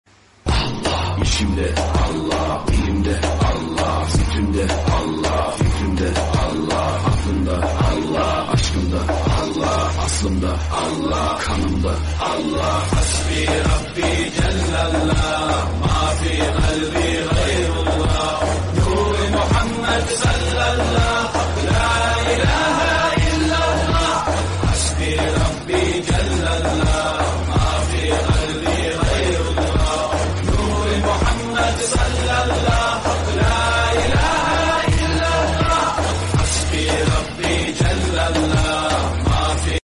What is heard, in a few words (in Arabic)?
hasbi rabbi jallallah arabic islamic